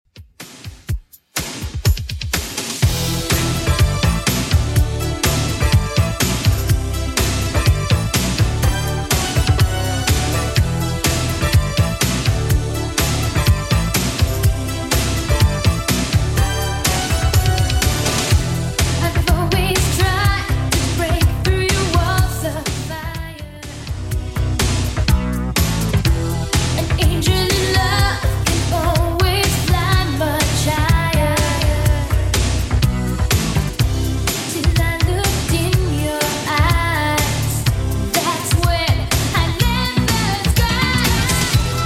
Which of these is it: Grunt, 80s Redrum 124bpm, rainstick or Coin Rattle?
80s Redrum 124bpm